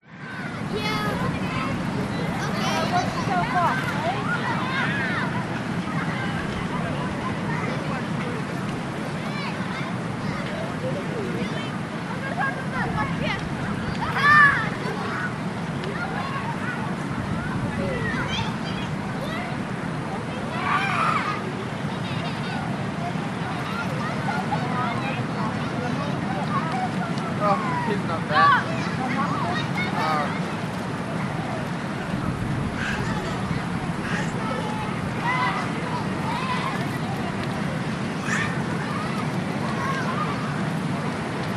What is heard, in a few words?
public places beach lake distant surf children playing water people chat close by